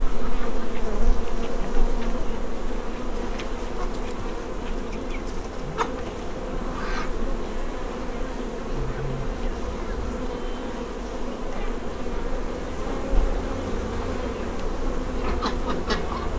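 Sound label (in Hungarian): rajzás, mezei méh; parasztudvar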